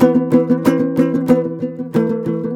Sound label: TOPSHUR; Soundscan vol.60; Asian Traditions; AKAI